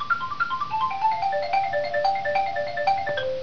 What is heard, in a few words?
xylophone